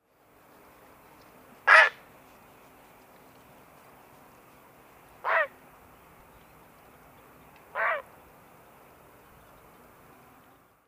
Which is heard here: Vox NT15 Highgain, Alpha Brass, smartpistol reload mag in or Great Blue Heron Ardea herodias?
Great Blue Heron Ardea herodias